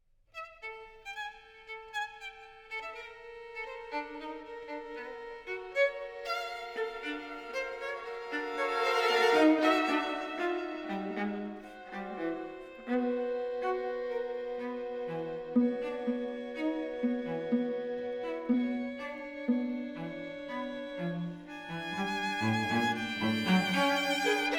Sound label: String Quartet; Gudmundsen-Holmgreen; Complete String Quartets, Vol. 1; String Quartet No. 3 ''5 Short Studies''